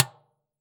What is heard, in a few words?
Dustbin, IR mono; Claustrofobia v1.1; Dustbin 2